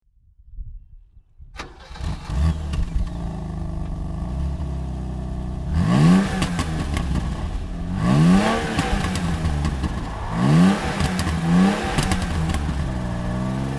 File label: BMW 323i